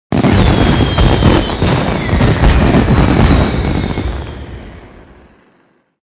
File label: Firework General Boom